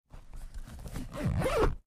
Zipper Close; zippers

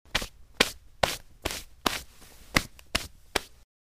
Brushing Off Jeans, cloth, wipe

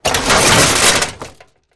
Impact Big Metal Car, car crashes

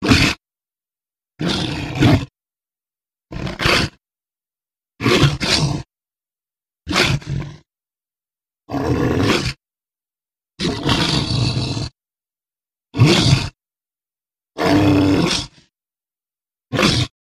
Monster Type 2 Roars, monsters

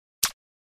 Button Hollow Comput

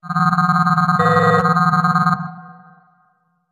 Data Scan Text Flutter, stinger

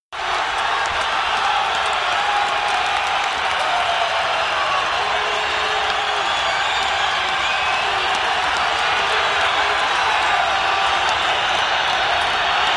Football Crowd Exci, sports arena football

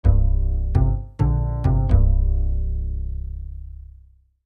Music Logo Bass Upr

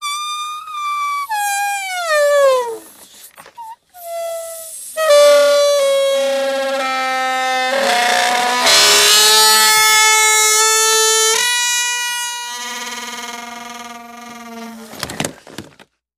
Giant Balloon Sque, squeak

